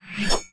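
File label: infantry sword, weapon; Battle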